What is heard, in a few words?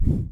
Simple Whoosh